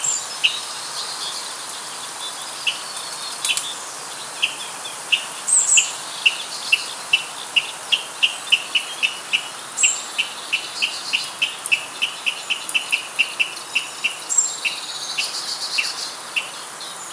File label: Water Rail